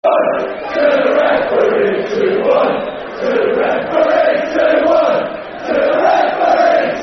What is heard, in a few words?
Football Chant; 2 1 To The Referee; Swindon Town Stfc